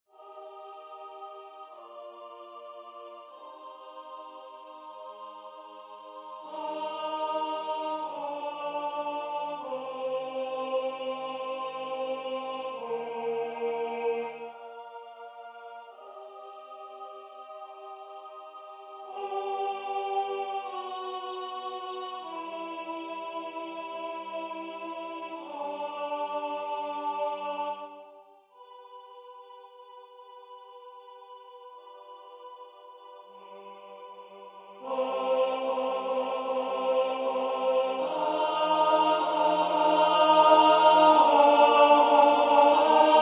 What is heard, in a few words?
sanctus ten